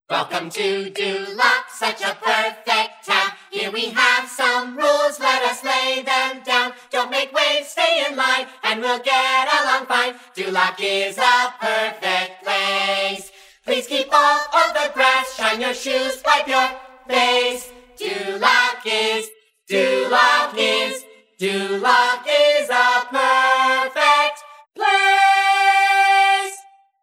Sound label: Vocal Only; Shrek; Audition Cuts; Duloc Performers